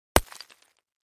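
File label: Brick Hit Sledge Hammer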